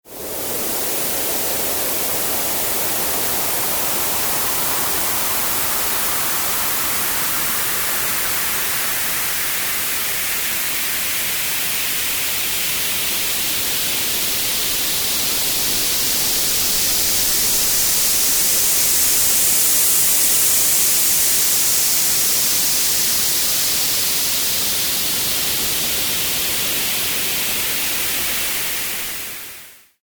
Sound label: Bilateral Sweeping Teal Noise